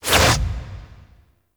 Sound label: Melee Block Attack, Whooshes; Warframe; Weapons; Blocks